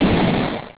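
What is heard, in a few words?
collision; absolute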